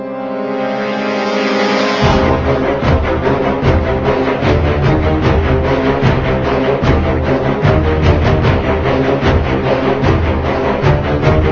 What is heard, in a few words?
Level Theme